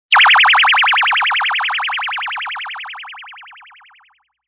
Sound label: Ringer; Rings